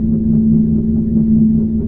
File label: font drone; Earth and Beyond; EA Games